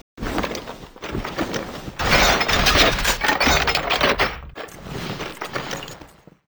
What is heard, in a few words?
recall leadin; LoL; varus skin03